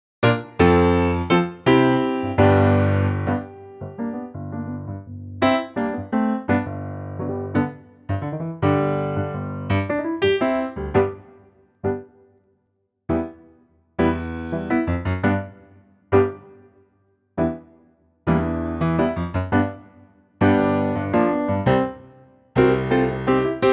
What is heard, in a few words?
G piano